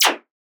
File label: fx laser noise blaster; Virtual Riot